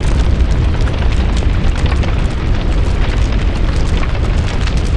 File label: Massive Blaze; spaceship